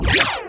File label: ghost cube attack